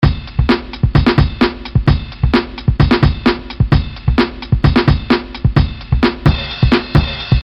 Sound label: Loop